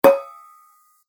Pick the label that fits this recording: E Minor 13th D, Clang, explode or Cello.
Clang